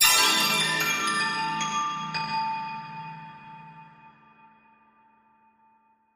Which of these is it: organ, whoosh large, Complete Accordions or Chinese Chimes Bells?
Chinese Chimes Bells